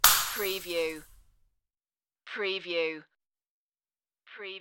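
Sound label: Small Glass Break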